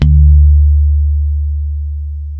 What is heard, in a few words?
D 3, ultra pick, bass; Spectrasonics; Bass Legends CD 1